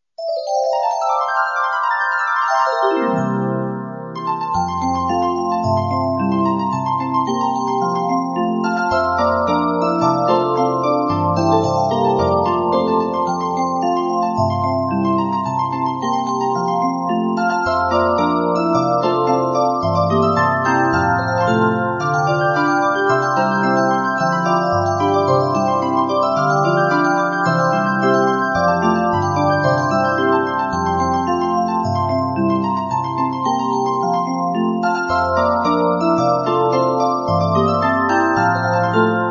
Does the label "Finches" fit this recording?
Yes